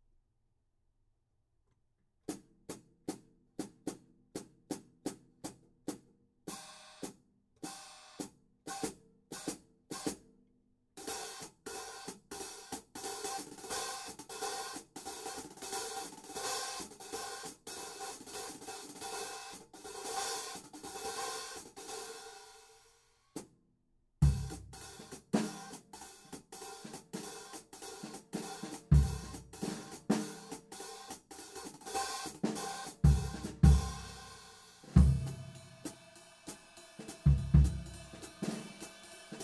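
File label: Istanbul Agop 13 hi-hat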